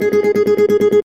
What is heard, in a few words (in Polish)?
rozsypanie figury